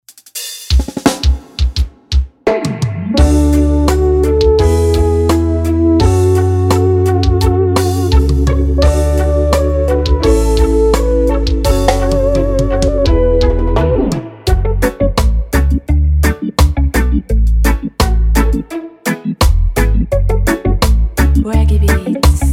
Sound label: Reggae Drummer Riddim TAGGED 84 BPM